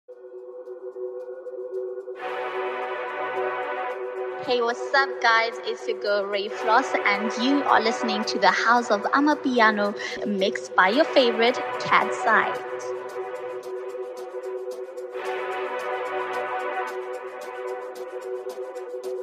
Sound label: Amapiano